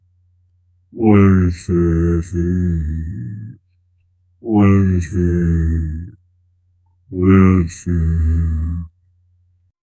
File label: create a wise old fox